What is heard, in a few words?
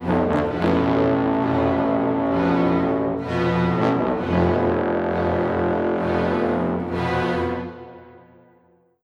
Play Stinger; ChoGall